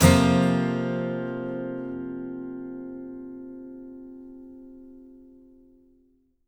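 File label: acoustic guitar F sus down, Whole Lotta Country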